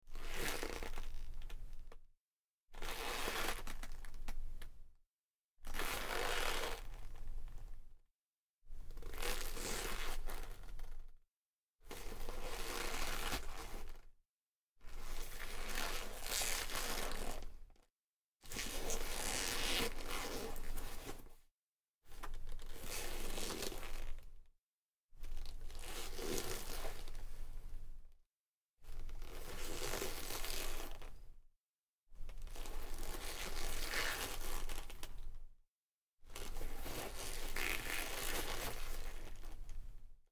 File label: Clothes Leather Jacket Twist Short Creak Omni Mic; Guild Wars 2 (Original Foley Soundtrack)